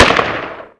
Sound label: Inf Weapons; Red Orchestra 41-45